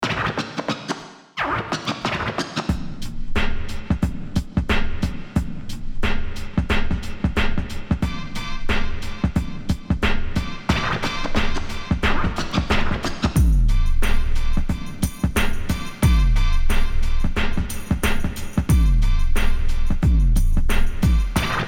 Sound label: Backstreet Hip Hop